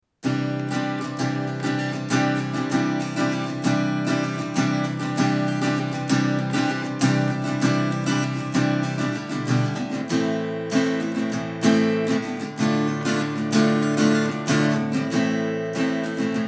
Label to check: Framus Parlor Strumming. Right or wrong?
Right